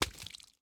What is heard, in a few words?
shallow water, walking; factorio 2.0.55